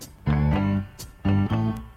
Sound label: omg music